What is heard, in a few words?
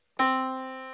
Yamaha Disk, piano sound